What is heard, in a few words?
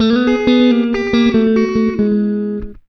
Blues, Electric & Acoustic Guitar Loops; USB Soundscan vol.04; AKAI